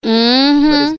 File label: mmm hmm, voice